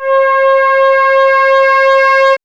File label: MOOG B 8; AMG - Vince Clarke Lucky Bastard